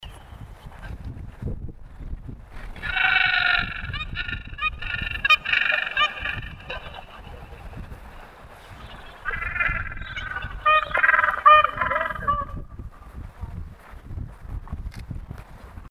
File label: Gentoo